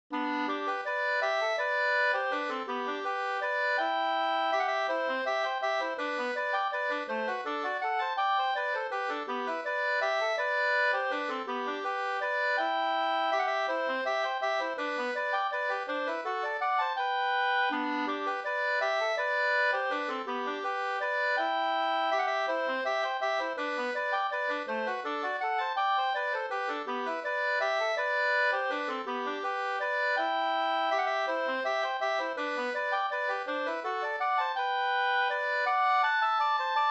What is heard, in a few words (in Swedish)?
vals 2st, Nyckelharpan; Gas-Anders